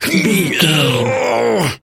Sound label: voice male effort long